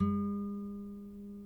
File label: NYL NV A, nylon no vib guitars; Spectrasonics; Hans Zimmer Guitars VOL-1